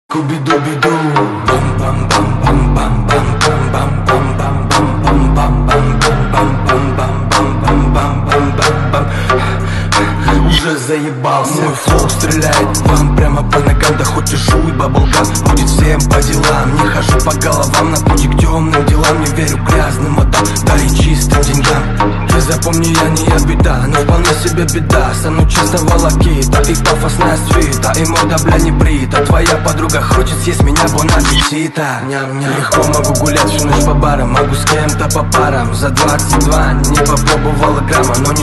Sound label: Mobile Ringtone